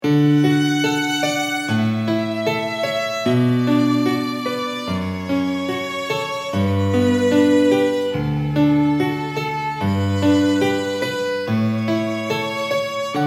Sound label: Canon in D Grace Notes